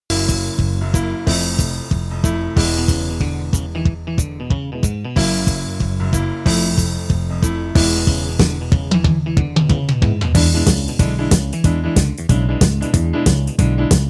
country rock

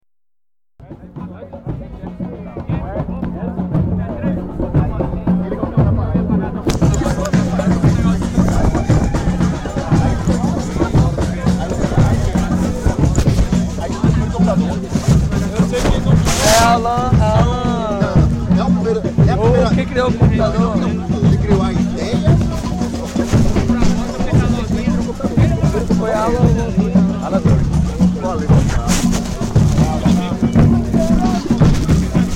Techno Beat; prelude

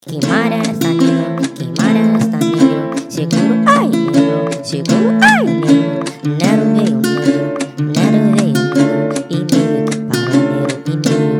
speed 135x bpm 162

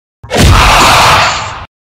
horror sounds scary scream creepy sound effect

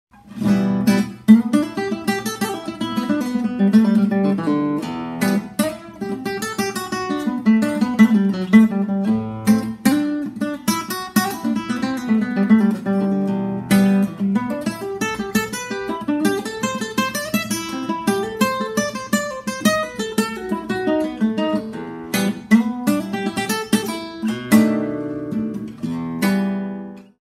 em blues improvisation

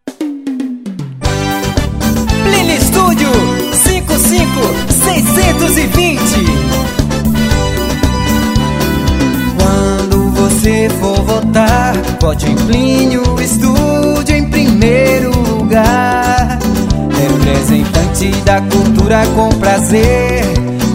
sax

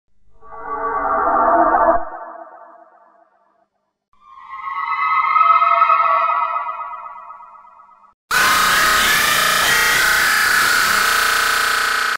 horror sounds scary sounds

